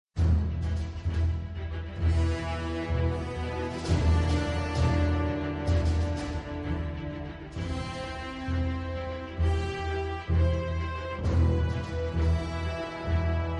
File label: loop; Avengers Landing